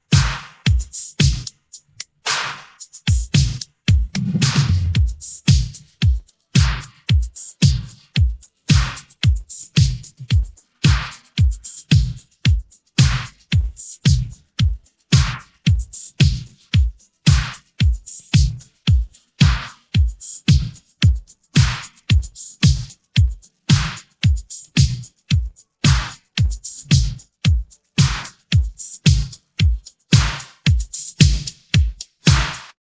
Neon Pulse stems drums; logic pro 11

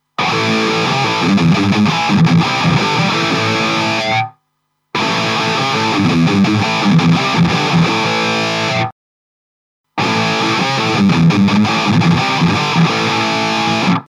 Fortin Zuul Blackout Distortion Medium

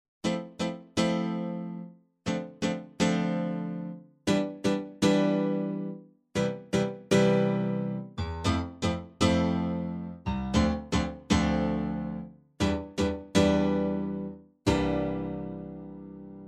RK100S M1 Piano

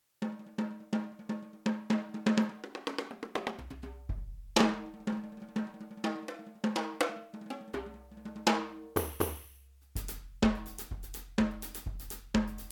Perc World 1 Kit; ALESIS Strata Prime

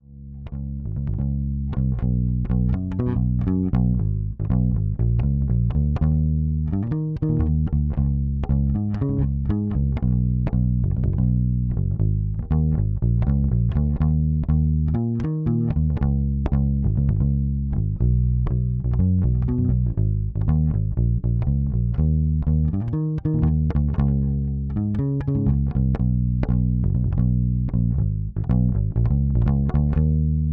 Soul Sound

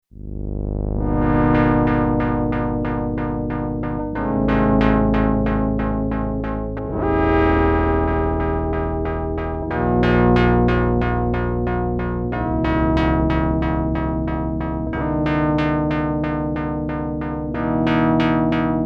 LFO Bass

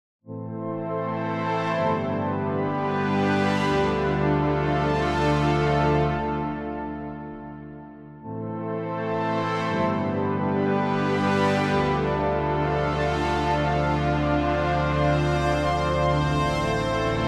Korg Arp 2600 VST Poly Pad

